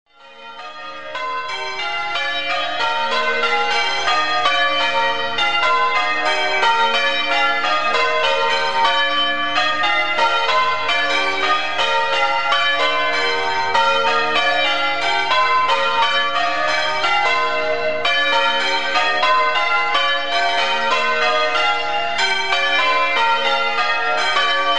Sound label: bob minor, tower; landewednack